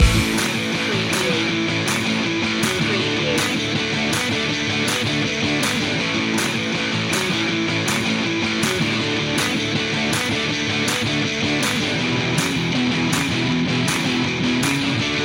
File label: maximum rock n roll loop